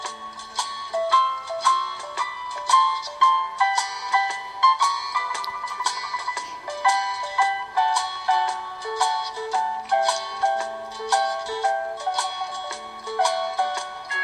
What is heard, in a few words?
honk tonk